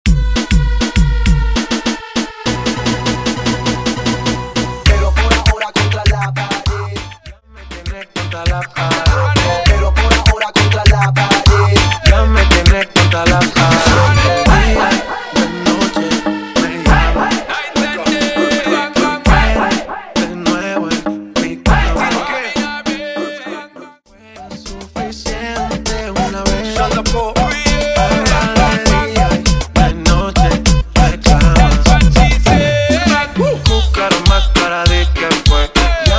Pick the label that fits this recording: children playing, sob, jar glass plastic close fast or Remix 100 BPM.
Remix 100 BPM